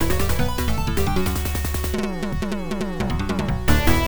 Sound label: Game Over Jingle; Burning Dragon Plus; PC-9801